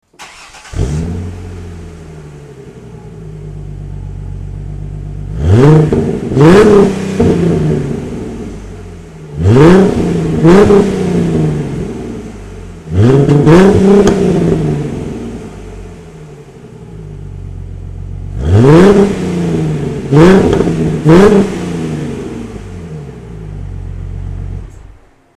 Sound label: V6 GT muffler header, mustang; JBA header